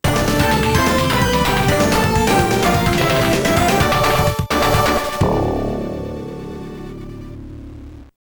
Level Clear; Gunstar Heroes; The 16bit Audiophile Project; Genesis

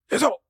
Sentry Fat Attack

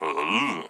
Blacksmith Action

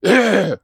Worm Charge; Col Worm Charge 03

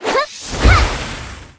Magi F attack

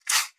Rattle Snake; Rabbids Multiverse

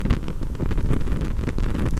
Torch

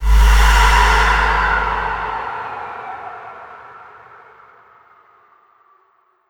Dark Magus Impair Hit; Legend of Keepers

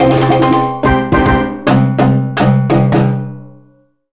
beach boss success; beach boss 08 success bpm14; gamerip; donkey kong country returns hd; switch 2

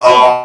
Male Hurt Robot; Mars Sortie

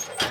Big Lift Gate Door Open; gamerip; Ruins; Thingy

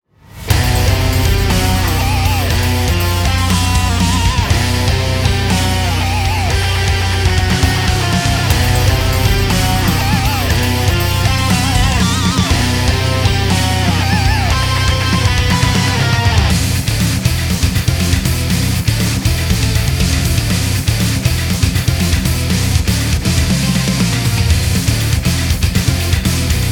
Battle Boss; Type Submerse

